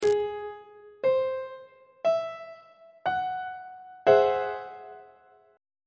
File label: g sharp augmented 7th chord on treble clef